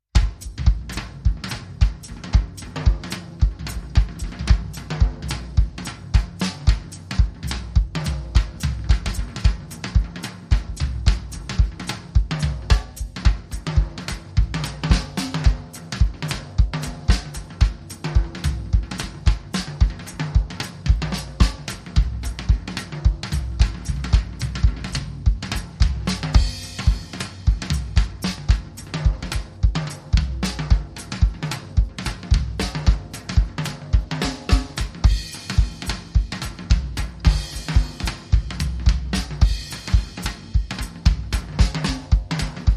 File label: Jive Bass Go Round